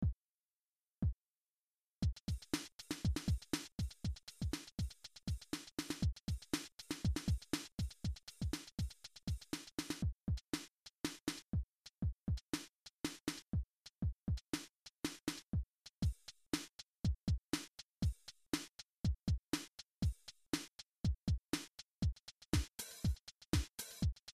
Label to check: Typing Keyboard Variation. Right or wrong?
Wrong